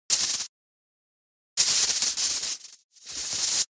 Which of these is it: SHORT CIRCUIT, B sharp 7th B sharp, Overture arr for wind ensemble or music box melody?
SHORT CIRCUIT